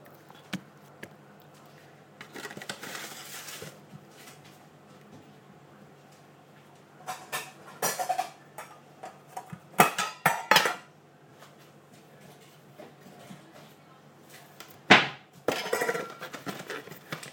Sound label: feeding frenzy soundscape